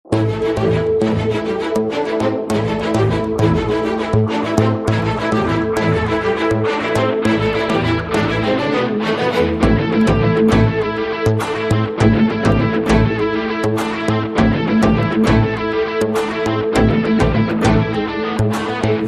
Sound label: BGM Theme Ringtone